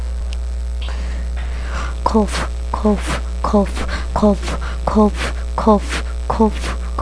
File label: cough